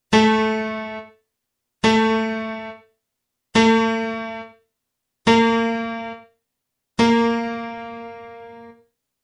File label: single piano